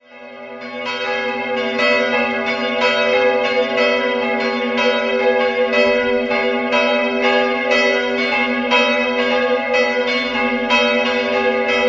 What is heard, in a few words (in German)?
Grossnottersdorf, Glocken